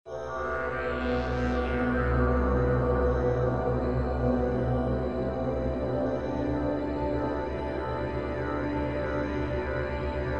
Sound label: Pulsing synth and effects; Ambient SoundScapes